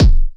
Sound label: kicks, Bass Drum